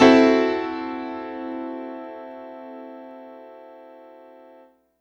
Piano stack (C) funk; Single Shots; Misc; Sonic Foundry (Sony Creative Software); Ugly Remnants VOL-2

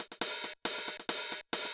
Hi Hats, Club Techno, 137 BPM; Zero-G; Construction Kits

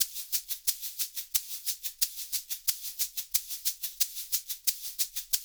LOOP, SHAKERS, Percussion; InVision Interactive; Percussion Slam

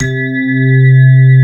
FM piano C2; East West - Global Sounds; SD1 KEYS

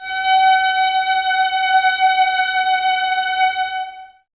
violas G 5, String Ensembles; Miroslav Vitous; VLS 1+2

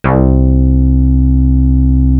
SY77 BASS; East West; Global Sounds; DIGIBASS

